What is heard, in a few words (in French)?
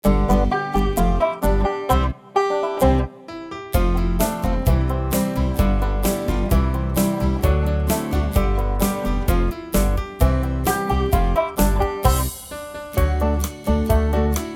Addition et soustraction (Instrumental)